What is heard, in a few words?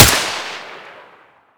sr25 suppressed fire